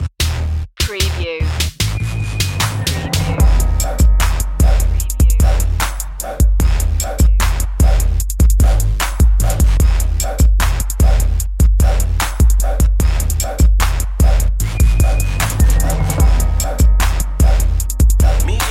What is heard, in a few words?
bad ass hip hop